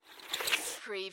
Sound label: Bicycle Skid